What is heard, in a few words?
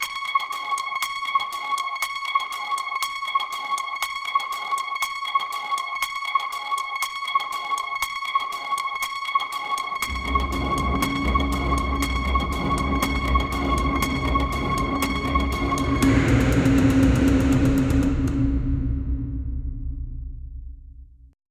horror glitch terrified